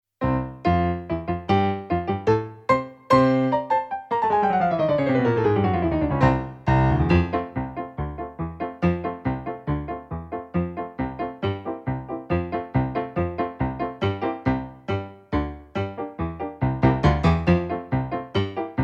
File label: Piano